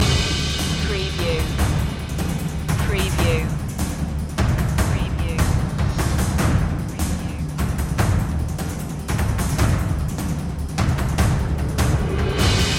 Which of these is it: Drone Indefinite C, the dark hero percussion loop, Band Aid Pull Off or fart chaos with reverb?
the dark hero percussion loop